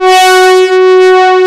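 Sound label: MEMOOG BRASS; E-MU Formula 4000 Series Vol. 3; Analog Odyssey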